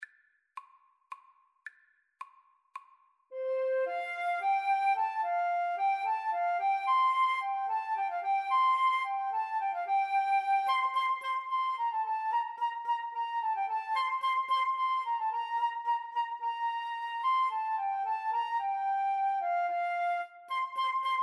water music flute oboe, Flute-Oboe Duet

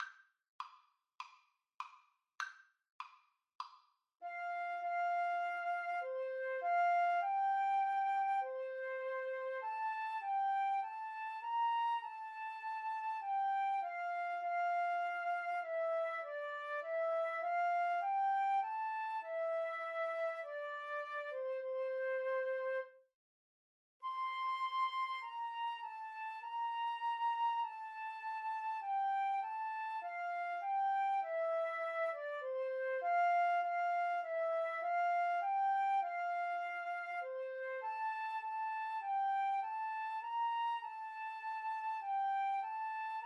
Flute duet

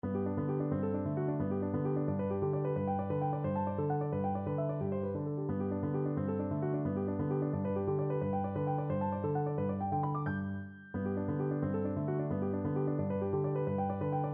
courant, piano; burgmuller

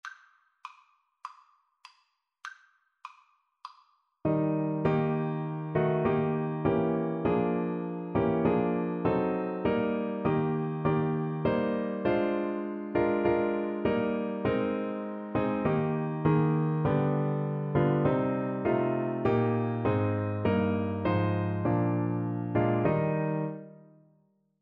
Clarinet

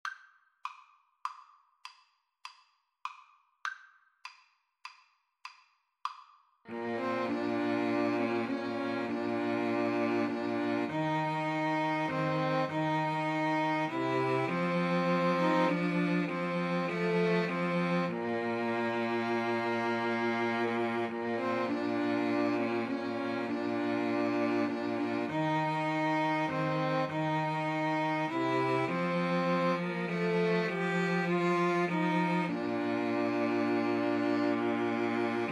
2 Violins Cello

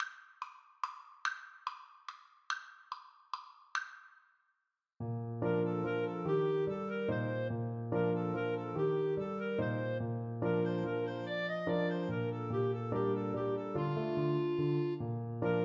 Flute Clarinet Duet

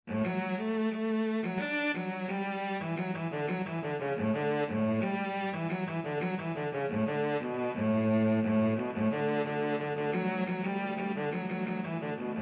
cotton eyed joe, cello ensemble

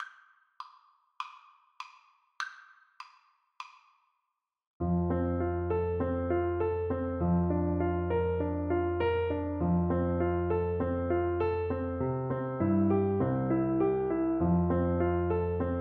the possums tail is bare, Tuba

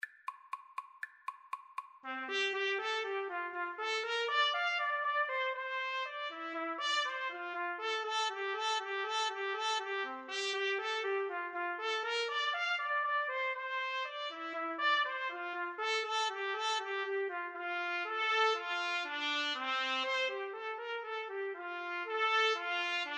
trumpet cello, Trumpet-Cello Duet; offenbach can can

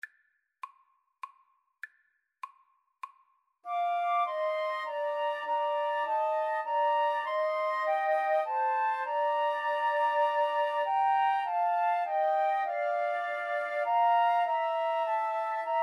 faith of our fathers, Flute Trio

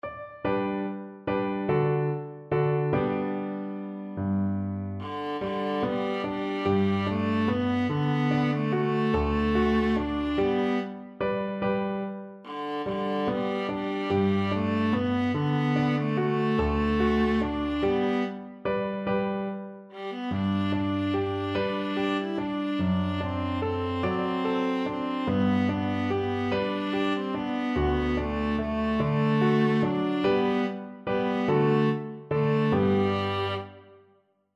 viola

